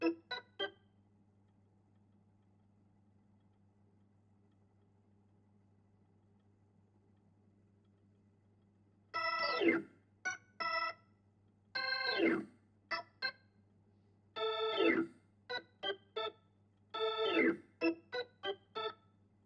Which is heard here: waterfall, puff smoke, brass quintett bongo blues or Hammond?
Hammond